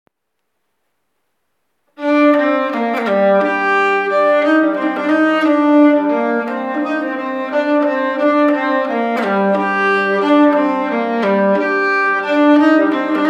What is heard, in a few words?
polonas fran Skane 2e voix, nyckelharpa; octobresaintdie